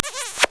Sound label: KISS; Leisure Suit Larry 6 - Shape Up or Slip Out; Floppy DOS; scummvm